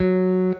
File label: Guitar & Bass; Quantum Leap